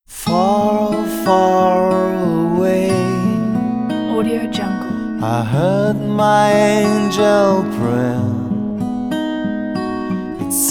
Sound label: angels prayer ident; adigold